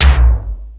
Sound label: Tom, Fairlight CMI III